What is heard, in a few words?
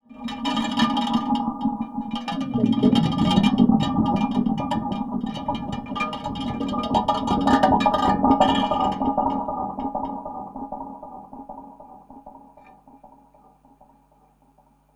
Moog LPF & Delay, contact mic, Tin Of Guitar Strings; musicradar; contact mic madness